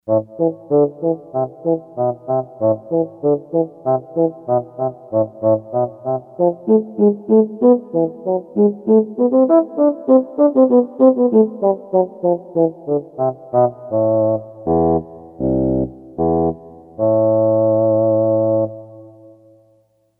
Bassoon; Orchestra 1

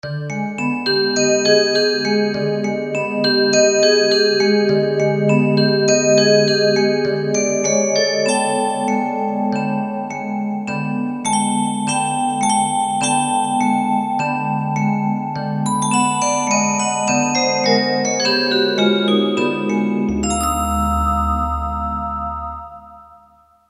Bars & Bells; Kurzweil K2000 V3

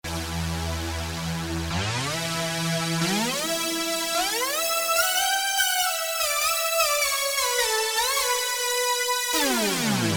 Porta Synth; Keys; Roland SRX-07 Ultimate Keys

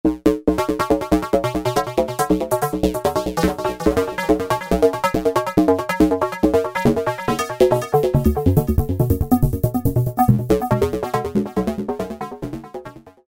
Short Ring Perc., Korg microKORG; Factory Voices

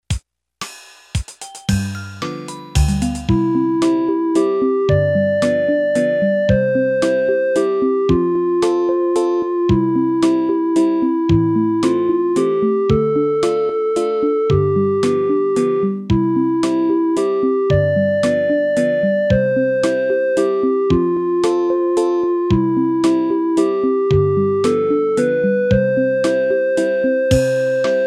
Yamaha PSR-300 Factory Demo Songs

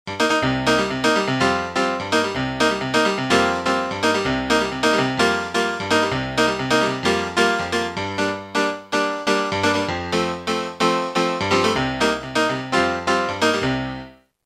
Simple Piano; Roland M-DC1

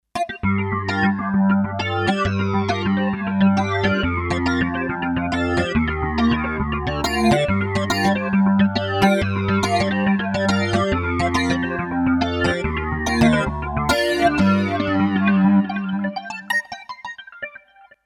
Clavaxx, Kawai K5000S

